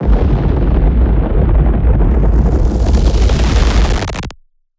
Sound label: impact digital thunder; Virtual Riot; Impacts